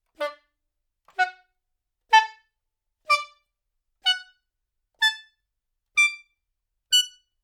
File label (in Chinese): 传统笙 演奏技法 单吐